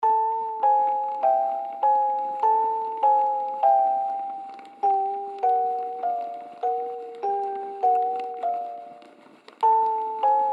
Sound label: Horror music box distortion loop